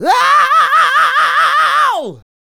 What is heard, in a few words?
Male Scream; Sound Bites; Sonic Foundry (Sony Creative Software); Vocal Toolbox